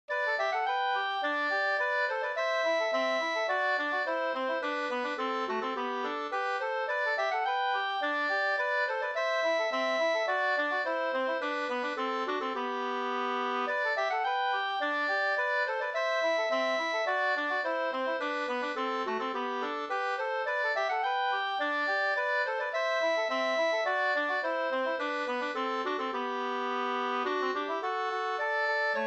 slangpolska 2st

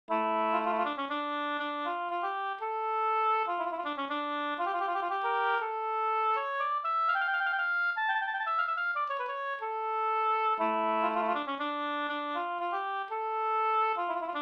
polska Bodapolska

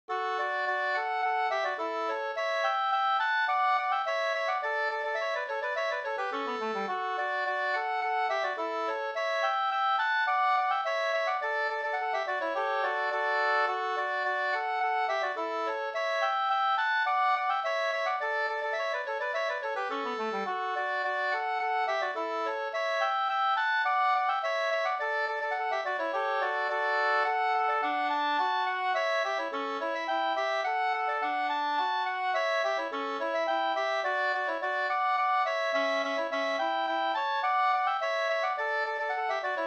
slangpolska

